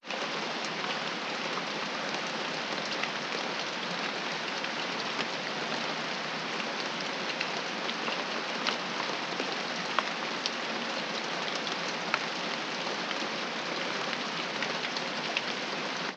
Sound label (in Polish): Deszcz